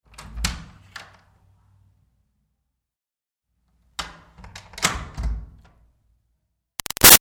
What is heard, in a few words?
Door Wood Church Basement O-C Lite; Miscellaneous Doors; Big Room Sound